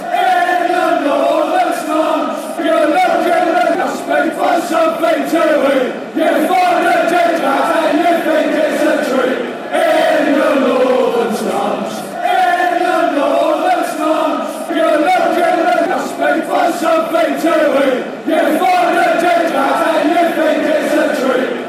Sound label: your northern slums fanchants